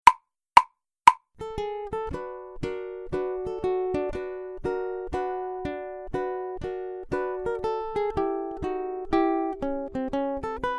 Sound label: March, ukulele; grade6